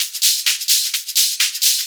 Hihat Loop 128 BPM

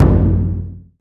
KODO, JAPANESE

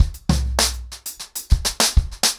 100BPM, ACOUSTIC DRUMS

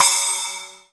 RV CYM

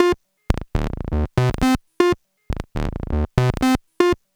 SERGE; AMG - Vince Clarke Lucky Bastard

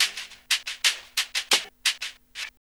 Hip Hop R n B; USB Soundscan vol.19; AKAI

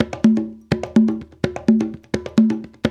African Tap; Spectrasonics; Supreme Beats

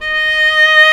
Vla D#3; Digital Sound Factory Virtuoso

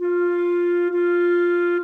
Clarinets, Orchestral; Sonic Foundry (Sony Creative Software) - Orchestral VOL-2; Modern 38

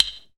FX SPACE, SPACEFUNK; Sound & Vision; Gigapack II CD 2 (Roland)

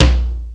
TOM L M1, DRUMS; East West; Global Sounds

